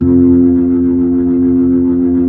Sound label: B-3